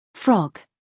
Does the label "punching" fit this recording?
No